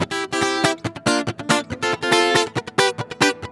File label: MERENGUE, World Grooves